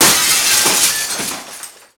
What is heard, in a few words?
Break Glass Large; breakable